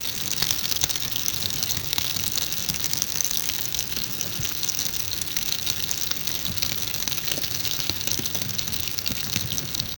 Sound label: raining potatoes